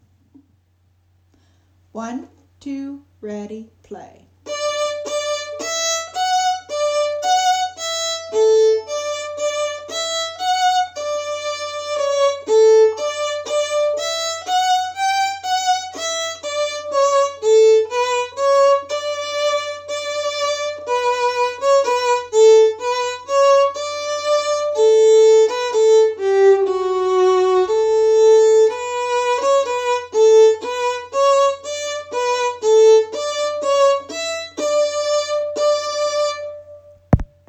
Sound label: Yankee